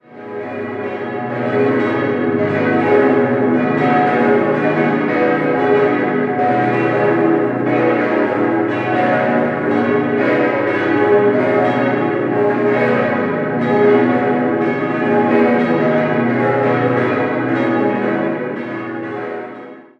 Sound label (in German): Steckborn, Pfarrkirche, glocken; Steckborn TG, Pfarrkirche St. Jakobus; Die Glocken wurden im Jahr 1963 von der Firma Eschmann in Rickenbach bei Wil gegossen.